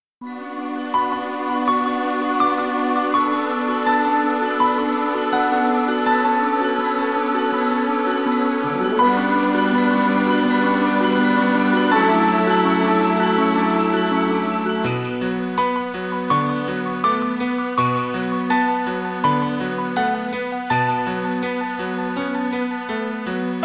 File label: winds whisper